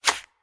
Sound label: Ticket Appears; Angry Birds